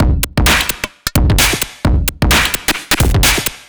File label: Beat Valve 130, distorted drum loops 130bpm; musicradar